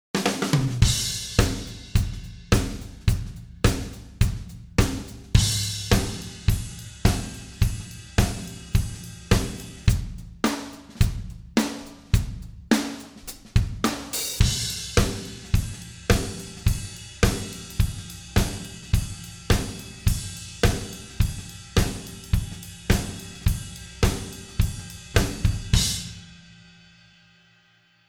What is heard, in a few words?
drum loops